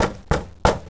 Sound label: THR BONK